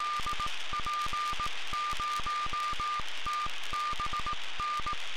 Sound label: 435MHz950 CW beacon, satellite; Tubin; On December 18th 2022 at 13:48 UTC Daniel DL7NDR received on 435.950 MHz the CW beacon of